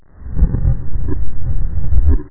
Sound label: eye die; castaway